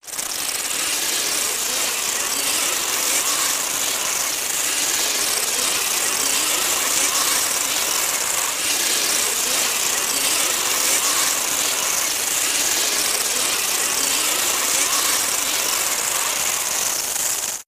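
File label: Crunch Munch Attack, body sounds; Crunching munching, attack of the killer ants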